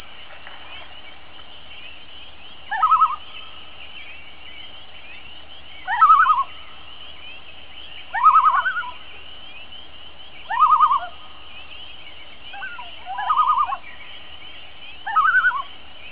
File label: loon